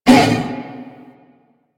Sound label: Wolf Attack Short; Animals; DE; Warframe; Quests; Duviri